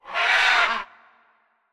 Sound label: Ara Parrot; Ara Parrot V O A1